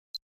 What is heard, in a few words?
Stopwatch Beeps On